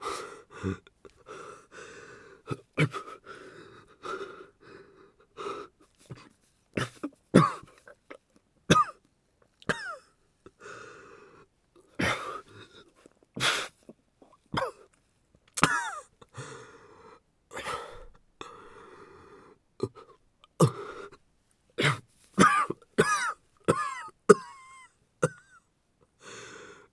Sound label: breath death; Escape from Tarkov; scav3